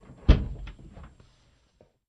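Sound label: Cutlass Interior Door Open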